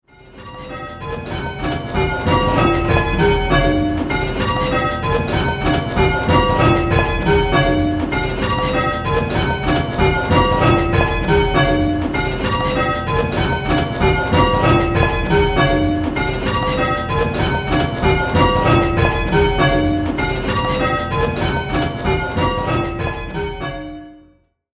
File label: 6-Bell; Who's leading; Which Bell is Leading?